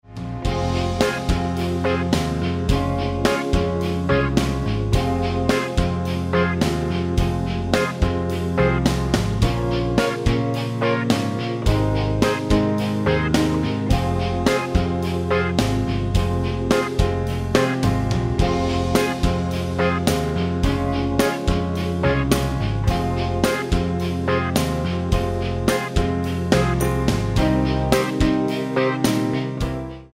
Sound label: Alt Rock Jam Improvise With F Pentatonic Minor Scale